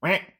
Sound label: duck